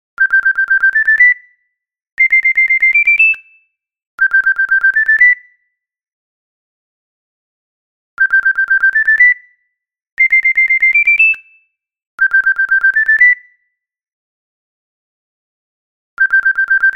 Ringtone Classic; Proximus

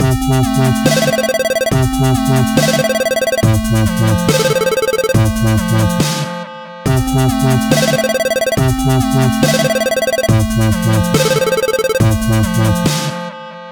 Wobbly Ringer; CyanogenMod exclusive ringtones; CM10

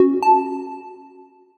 Alert, notifications; Purple; Telstra

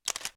Shutter; LG

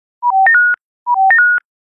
Message, Nokia tones digital; N79

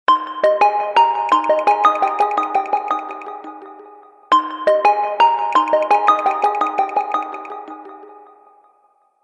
Meditation

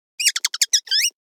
Message, Nokia Alerts; Windows Phone apps

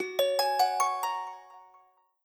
Ice Bell; Lenovo; P2

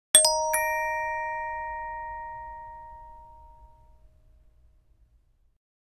Email, Nokia Alerts; Windows Phone apps

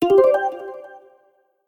Arp, notifications; KONKA; SP6

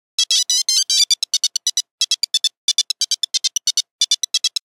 Nokia 8310 Electric eel, Nokia Buzzer; Windows Phone apps

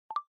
Video Record, ui; Iris; N30

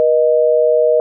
tone dispatch busy; Motorola; i886

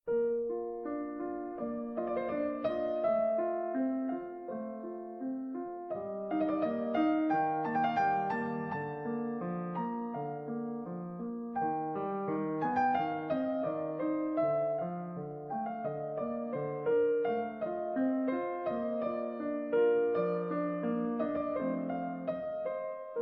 Mozart Sonata, ringtones; Calm

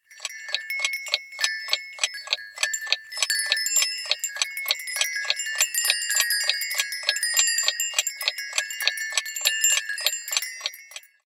Windbell, alarms; Coolpad; T2-W01

